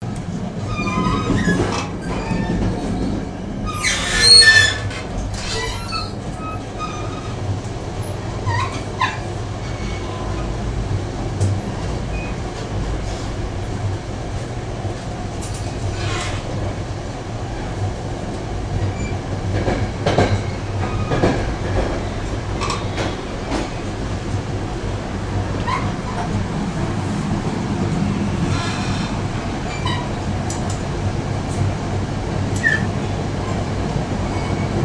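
squeaks squeals other train motion sounds leaving Grand Central Station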